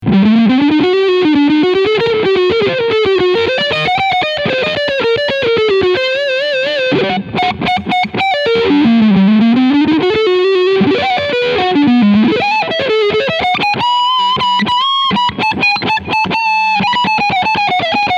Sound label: Leadsound Front PU